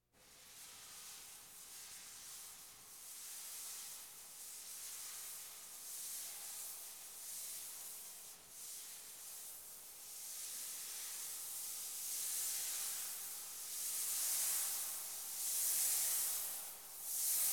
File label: Percussion Bongos Tympani rolls Gongs Drums